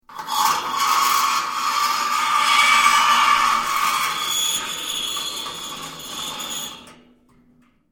METAL SCREECH LONG LOUD; Metal (Sound Effects:Materials)

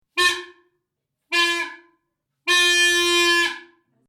Horn Three Blows, Blowing three times on a horn; Can be comedy horn or just a regular horn; Horn Sound Effects

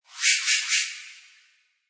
Whoosh Hit Three Times Fast; Scifi Production Elements, Set 1

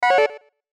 BLIP SYNTH; Beeps (Sound Effects:Multimedia)

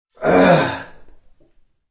Male Groan, A man groans as if in pain; Groaning; Voice

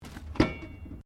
Bin Pedal Metal Open, Chrome pedal bin; Garbage (Sound Effects:Household)

